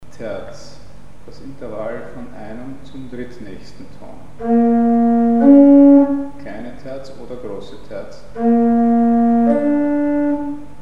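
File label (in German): kleine und große Terz; Mikrobuch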